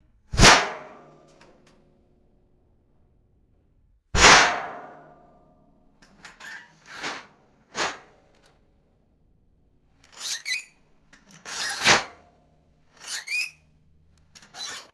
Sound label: metal spike puncturing and scraping aluminum; foley